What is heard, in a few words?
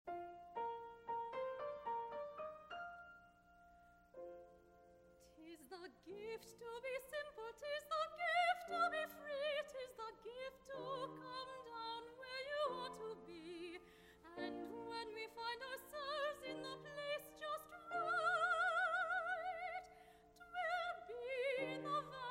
folk song, opera singer